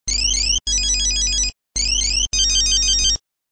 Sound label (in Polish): Śmieszny Dzwoneczek